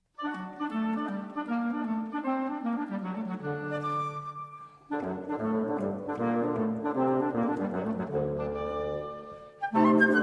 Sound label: Quintet; in G Minor; Mvmt III